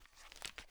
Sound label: rem870 start, shotgun; weapons; garrysmod